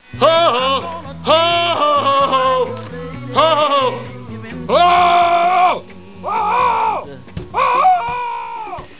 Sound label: hahahohaha; Anchorman